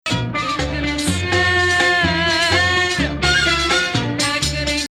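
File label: Mustaar, maqam; Rast; Musta3ar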